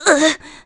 Hurt; Link getting hurt

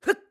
Attack; BOTW; Breath of the Wild; Link

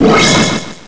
Transform

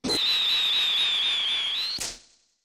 Bubble Fire; Firing the magic bubble (includes popping at the end)

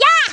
Slash; Talo mimicking Link's sword-slashes

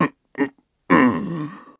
Stretch; Groose stretching; Skyward Sword

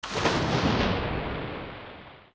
Zora Dive; Zelda; OOT

